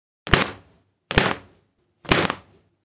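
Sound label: sharp sound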